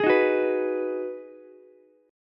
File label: Ab Minor 7th down Ukulele; strummed; JGuitar